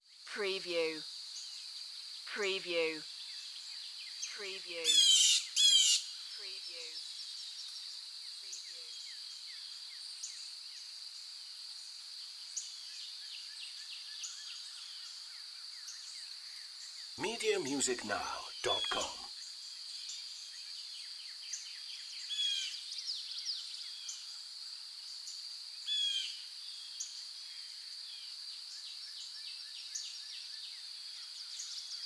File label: Forest Morning Birds